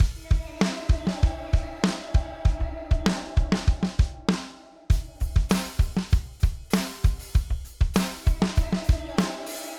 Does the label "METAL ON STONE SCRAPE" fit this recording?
No